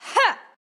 Swipe Normal; Jackie; Going Under

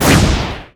Enemy Flame Skill Shot

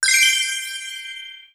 Hud Medal Progress; Cabela's Hunting Expeditions; PS3; Wii; Xbox 360

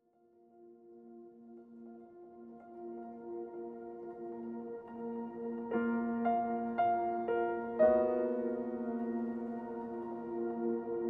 60bpm 6-4; Planet of Lana; Highlands Full Mix

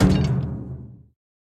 Weapon Metal Heavy; Going Under

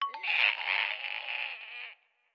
Skeleton Death; Going Under

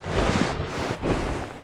Gasagoso Box

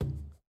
Weapon Rubber Stinger; Going Under

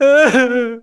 Cry

